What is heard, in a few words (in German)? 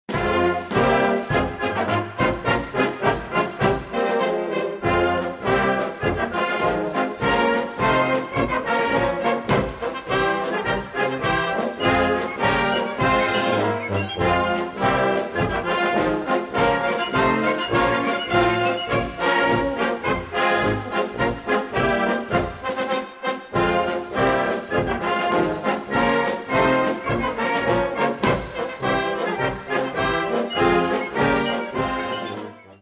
Slawischer Marsch